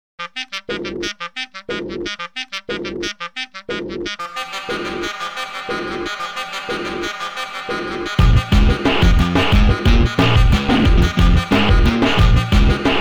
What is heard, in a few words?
Clarinet